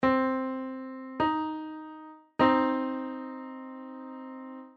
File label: minor 3rd, musical intervals